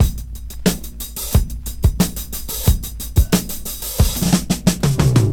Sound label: 90 bpm vinyl record drum loop sample d sharp key, kick tuned to the D# note